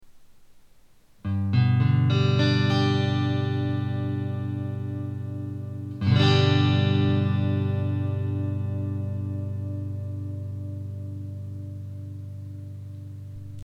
g dur, guitar

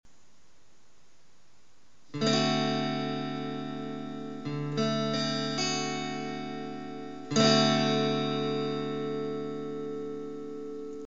d dur, guitar

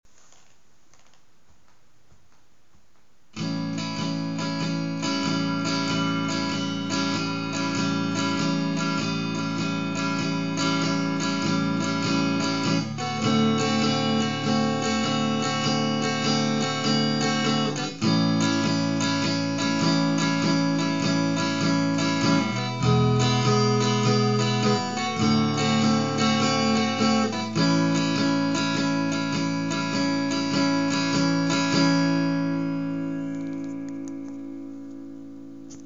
12-takt blues a

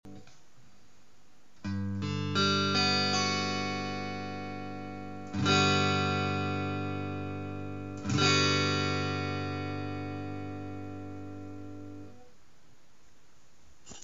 g maj7, guitar

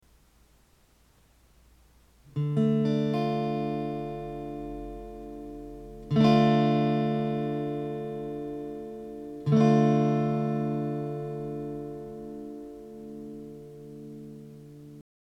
d-moll, guitar

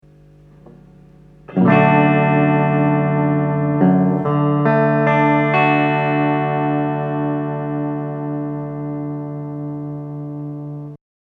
d f bass akkord, guitar

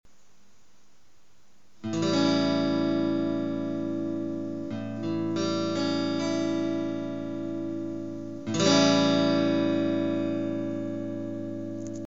a dur7, guitar

